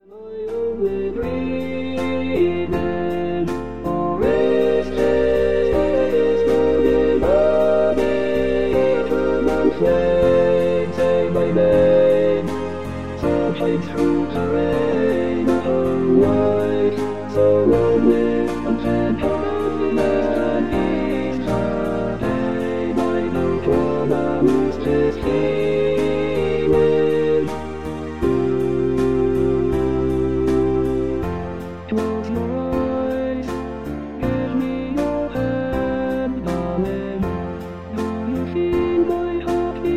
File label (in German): Chor